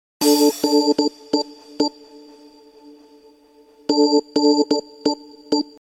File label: SMS Tone